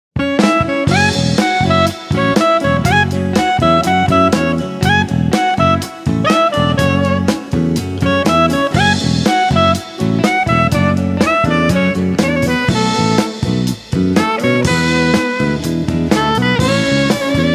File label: Childrens Dance